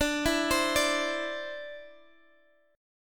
D 7th Suspended 2nd, D, down, Guitar Half Step Down